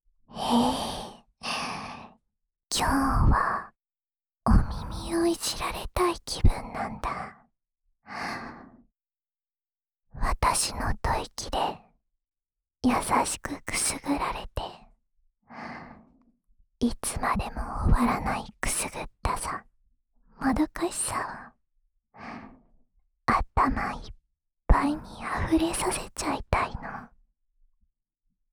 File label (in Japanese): 安眠用お耳ふーふー（右耳）